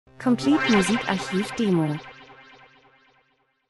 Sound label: transition; Transition & Movement - Entwicklung aufsteigend spannend